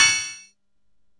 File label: blacksmith upgrade; NPC Dialogue